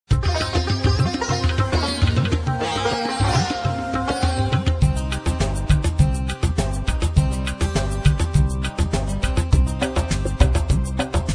india pop; indish; pa80; korg

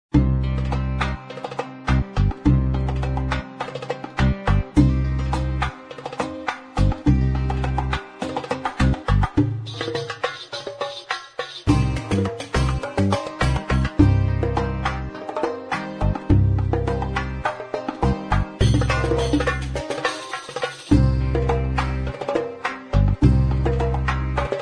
wahda, arabic, korg; pa80

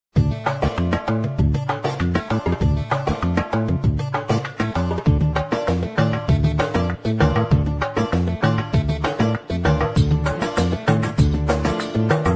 khaligi, arabic; pa80; korg

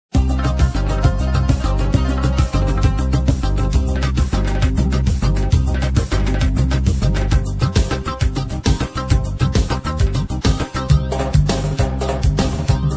6 8 mix, iranic; pa80; korg

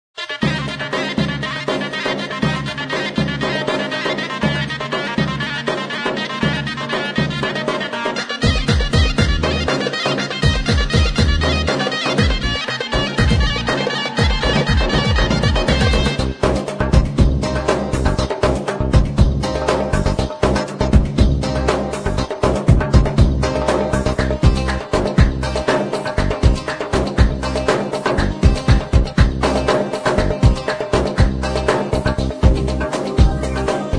katakofti, arabic

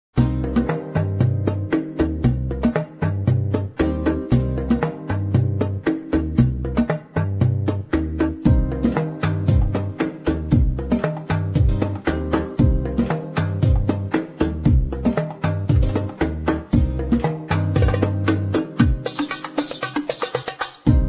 arab rumba; pa80; korg

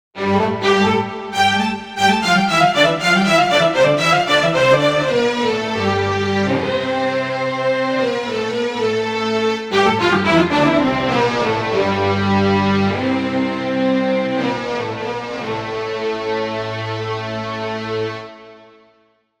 Orch W&H Step; Pa2x

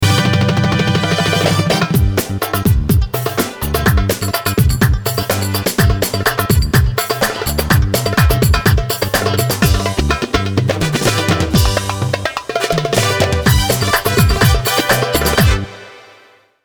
SAIDI, korg; pa80; exb pa1x

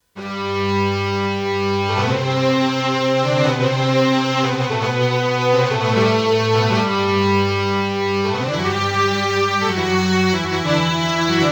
Legato Orch; korg; EXB Solo Instrumente

